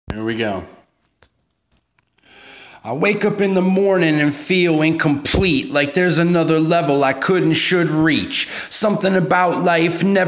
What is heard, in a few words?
vox, acapellas